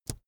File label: keyboard laptop space push soft